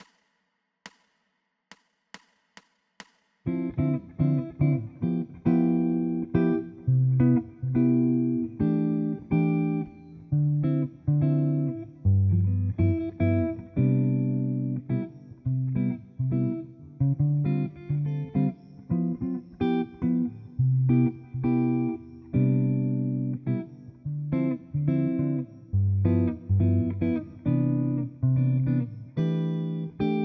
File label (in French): ii V7 I∆ standard, guitare jazz